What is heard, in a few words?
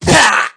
slide; Doctor Bone Cookie; Cookie Run